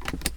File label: grab; Kerbal Space Program; KAS